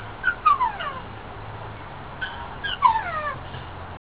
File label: Lemur Oww